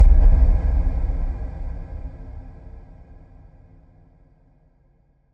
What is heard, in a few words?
Impact cinematic sharp attack bass boom with muffled slapback and reverb